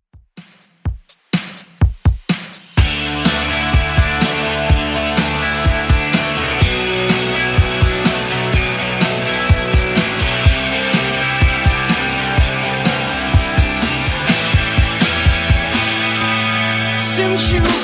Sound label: 80s 125bpm; Redrum